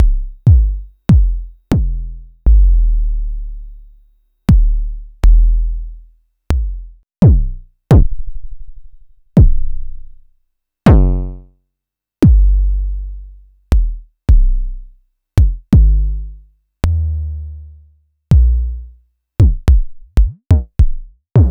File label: Vermona DRM1-MK3